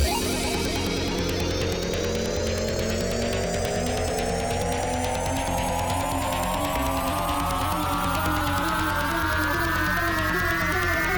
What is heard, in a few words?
Jingle; FRK Silvestershow; BitBurner